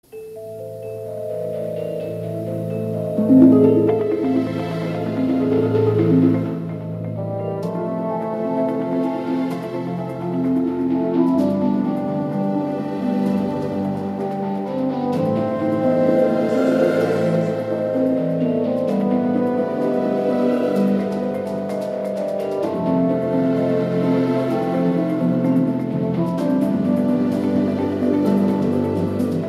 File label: Music Ringtone; PUBG Mobile Halloween Theme